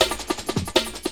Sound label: LOOP SD, Drum & Bass, 160 BPM; Ueberschall; Drum & Bass Resonance